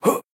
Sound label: jump; santa ski